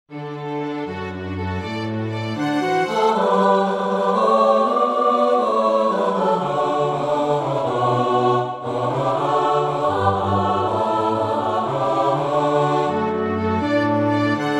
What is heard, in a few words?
Last choruses plus diddlies All